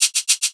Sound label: perc cabasa, Drum One Shots; SD MIDNIGHT Sample Pack; Slate